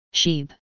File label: sheep, farm animals